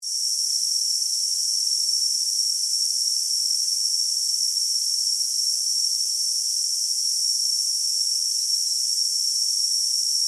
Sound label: Pneumatic Hiss, Scifi, Air, Steam; Science, Fiction; Spacecraft Sound Effects; SoundEffects-BlastWave